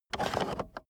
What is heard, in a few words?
car accessories cup holder push in fast; autos; verkehr transport